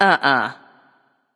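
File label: disagree, female; smite; voicepack; hera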